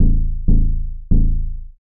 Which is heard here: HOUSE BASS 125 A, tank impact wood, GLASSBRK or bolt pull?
HOUSE BASS 125 A